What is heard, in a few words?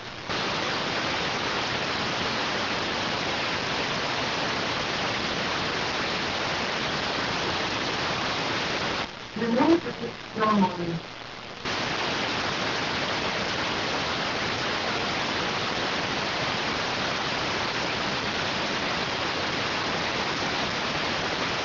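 radioteletype receive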